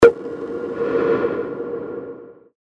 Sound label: A#, percussion; Flint; revealed percussion vol 2